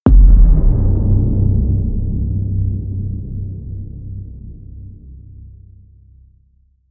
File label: bass drop